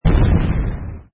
fire shot; inlogic-castledefense

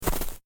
bunny step; inlogic-bunnyquest

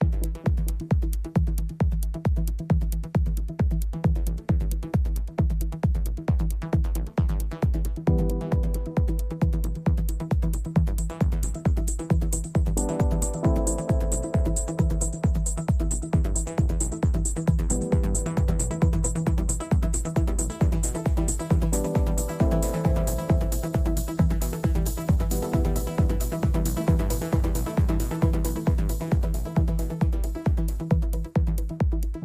music germany race loop; lightning speed

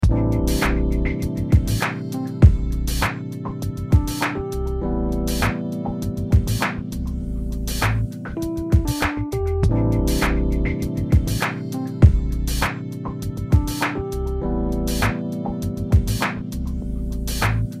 music pool; pool8ballmania

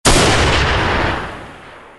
player explosion; Alien-Galaxy-War

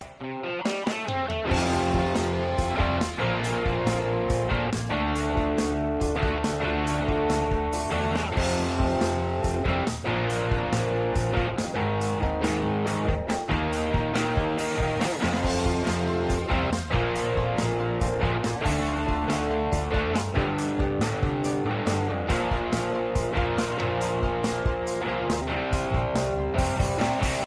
music cars race results; lightning speed

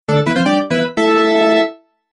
win; pharaohslotscasino

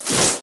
throw; Angry Zombies

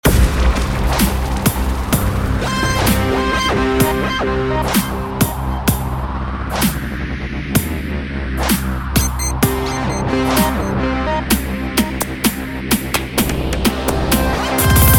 menu; inlogic-basketballsmash

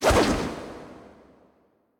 stylish; spider-man-mysterio-rush-game

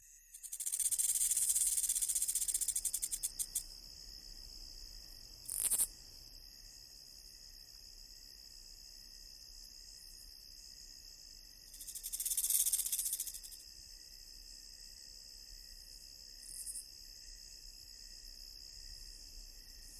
ambience desert; lightning speed

